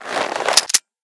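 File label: preparing parts for a machine gun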